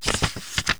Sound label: page; easyrpg; WitchsHeart